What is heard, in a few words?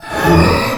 low inhale